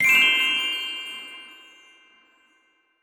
heart; ice age scrats nutty adventure

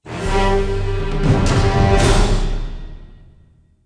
Stinger Ui Boss Intro; Iron Man 2; Nintendo DS

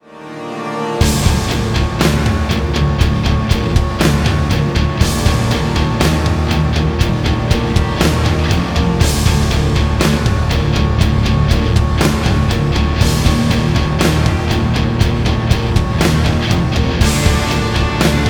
Action High; Emergency 5

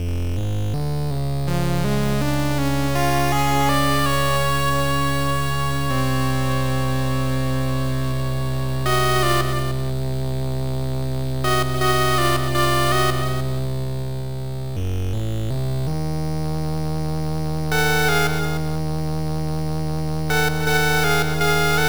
Dungeon; Lodoss Tou Senki 2; PC Engine Super CD-ROM2

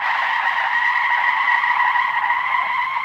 Screech Loop

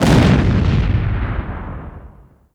Weapon Tank Gun Heavy

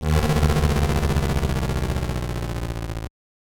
wail; startropics ii zodas revenge

